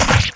hit

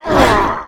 Hit

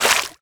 Idle squish

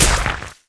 SALIVA

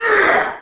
Hit

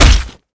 DMG HIT

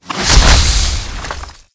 Weapons Swing

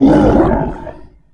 Death

